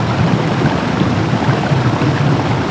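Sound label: underwater, ambient; Shadow Man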